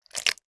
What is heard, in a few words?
horror guts bloody splat squelch in plastic bowl